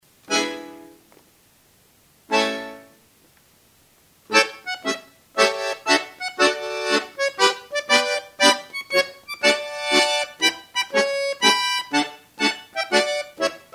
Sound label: bayan, mazurka